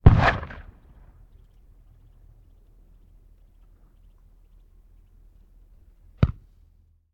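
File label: Cannon Cannonball; Sound Ideas; Guns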